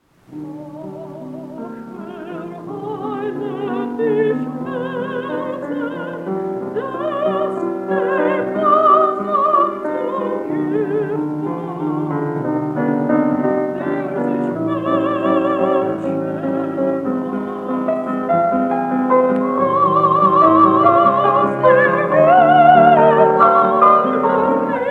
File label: Brahms Alto Rhapsody